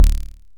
dry perc, Quasimidi Rave-o-lution 309

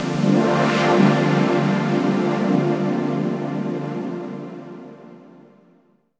Synth Shot

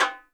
REPINIQUE; E-MU Producer Series Vol. 5 – 3-D Audio Collection; 3D Percussives; 3D Ethnics

